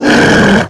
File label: Neutral wolf death